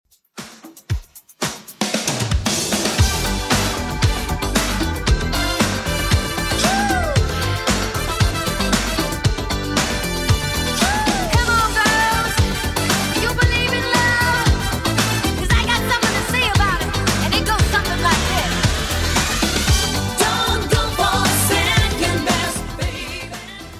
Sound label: 80s Redrum 115bpm